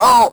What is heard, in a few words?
squish; Crypt of the NecroDancer